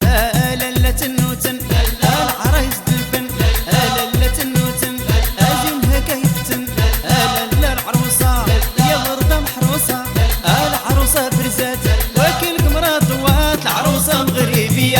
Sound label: Orchestre, مغربية